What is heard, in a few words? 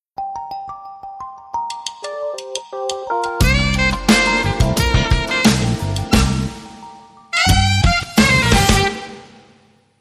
Saxy Sting